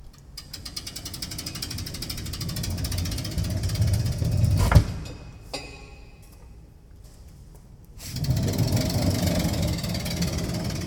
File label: Door